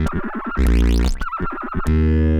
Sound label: Roland F Line, Roland S100; musicradar-synth-samples